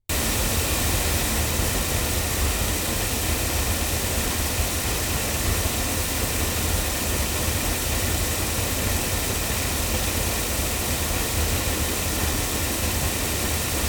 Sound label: loudspeakers